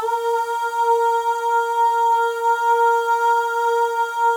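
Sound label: OH, female OHS, Voices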